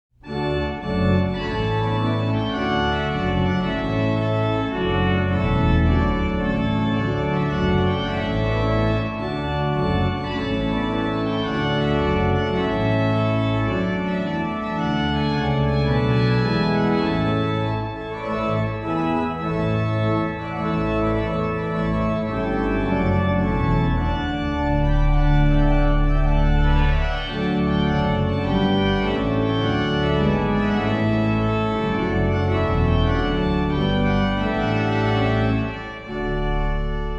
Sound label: Trumpet Tune; Henry Purcell